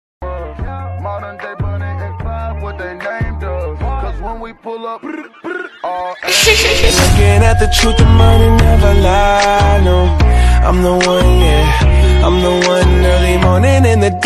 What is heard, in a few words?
slowed reverb ringtone